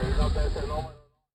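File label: CB Radio; Yamaha DTXpress-II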